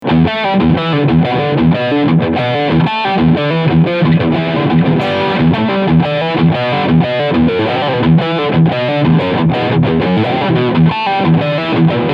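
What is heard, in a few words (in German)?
Epiphone Prophecy LP Custom Riff Sound Bridge Humbucker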